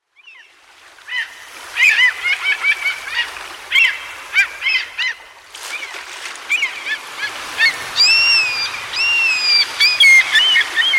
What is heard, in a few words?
Seabirds